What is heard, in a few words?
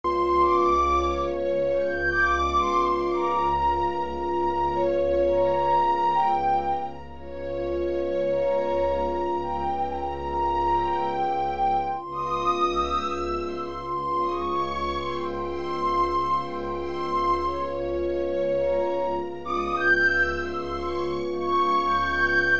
classica